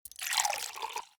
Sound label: add ingredient; va-11